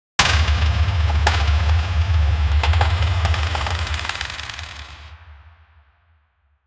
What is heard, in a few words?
massive stone gate openin; architecture